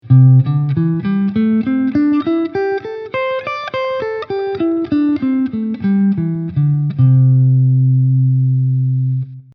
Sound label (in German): C Dur Pent